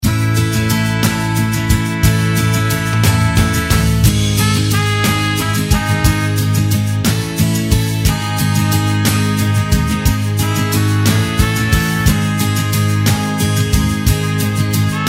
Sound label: Mexico serenad